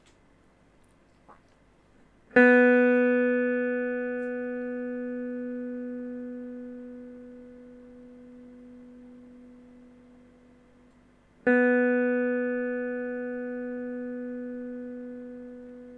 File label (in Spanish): Sonido de guitarra eléctrica cuerda 2 Si B; afinar una guitarra